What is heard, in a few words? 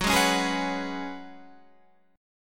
F sharp Suspended 2nd Suspended 4th, Guitar Standard; strummed; JGuitar